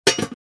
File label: Cooking pot lid close; utensil